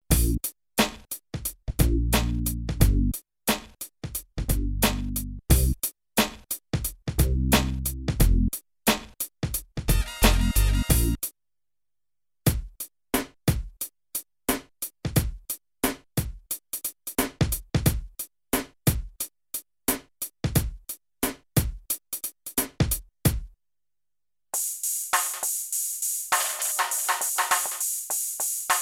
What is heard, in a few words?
beat, Some internal beats, Emu Planet Phatt; a Motorola MC 68000 CPU (mc68hc00fn10) system handles up to 32 voices of polyphony